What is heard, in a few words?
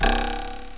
spring